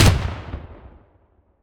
auto Shotgun; Security; WEAPONS

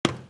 Footstep Metal; Footsteps

